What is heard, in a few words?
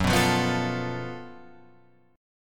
F sharp Minor Major 7th Double Flat 5th